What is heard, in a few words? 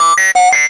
speak and spell STARTUP MELODY